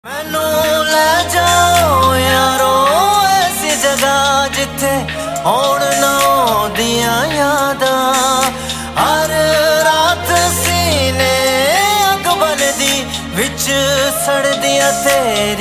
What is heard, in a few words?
Punjabi Songs Ringtone